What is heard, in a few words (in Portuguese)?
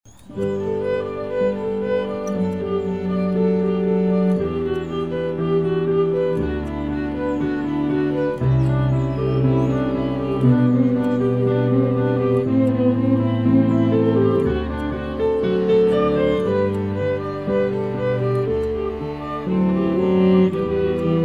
Piano e Cordas; Divertissement